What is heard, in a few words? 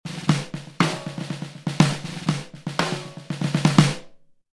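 gretsch 2 tuned down proc, Snare drum samples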